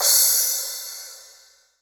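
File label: Cymbal Flanged Crash, Drum Machines, Alesis D4; 300 Drum Machines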